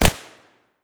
smg, fire; weapons

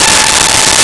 Trumpet A5; Nintendo; July 2020 Gigaleak

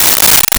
Ride; Nintendo; July 2020 Gigaleak

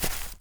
grass run, footsteps; chief

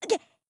death violent, grunt involuntary

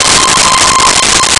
Violin C6; Nintendo; July 2020 Gigaleak

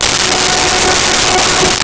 Strings Gb4; Nintendo; July 2020 Gigaleak

